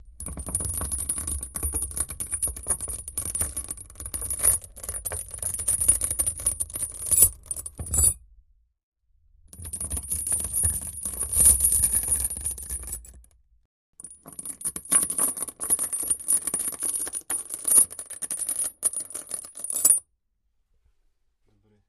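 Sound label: Chain Heavy Slow Drops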